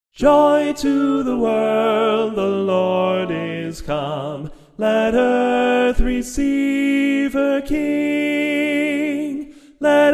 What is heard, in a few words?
joy to the world soprano